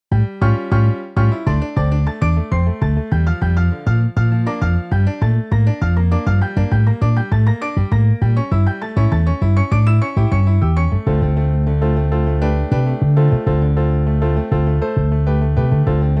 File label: Piano Bass MIDI